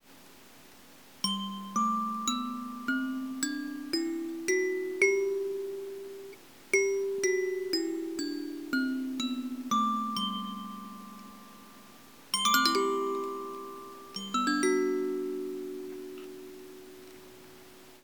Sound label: 8 note kalimba instrument kalimbas mbira G Major